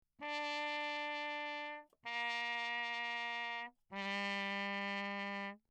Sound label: TRP MUTE Bach low; Perinétventiltrompete